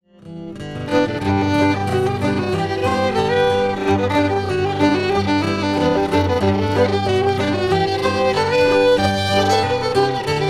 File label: Teabag Blues slow